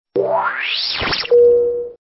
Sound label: Stinger; STINGERS